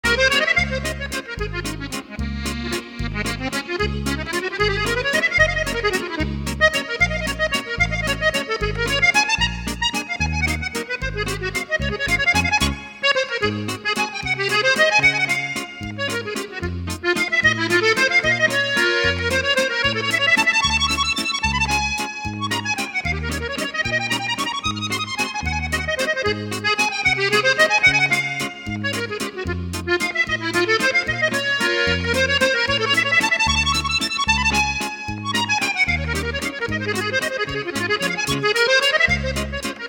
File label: Musette